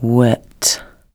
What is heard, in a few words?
Cave